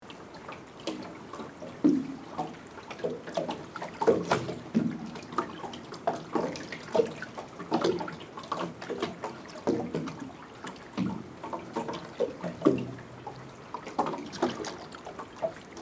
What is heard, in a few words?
boat bells